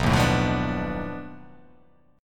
B 7th Sharp 9th Flat 5th B down

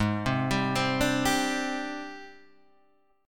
Ab Major Sharp 11th Ab down Guitar Half Step Down; AbM#11

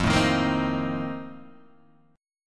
F 11th, Guitar, DADGAD

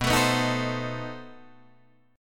B 7th Flat 9th B Guitar Open D

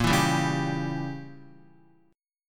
A sharp Diminished 7th Guitar, chord; strummed; JGuitar

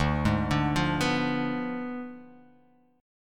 D Diminished 7th D

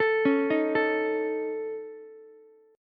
C sharp Suspended 2nd Sharp 5th C sharp Ukulele; C#sus2#5; arpeggiated; JGuitar

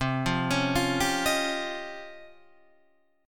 Db Major Sharp 11th C

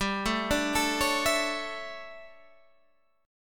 D Major 7th Suspended 2nd Suspended 4th

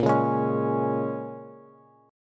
Bb-Minor 7th-Bb-down-Bass; Bbm7 strummed

